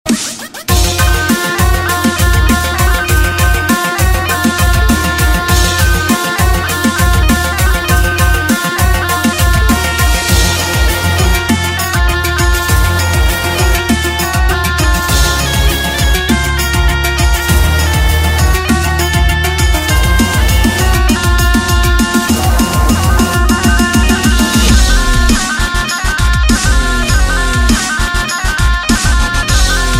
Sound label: Bolly Dubstep Rmx